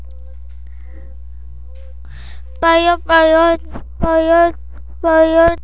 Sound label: fire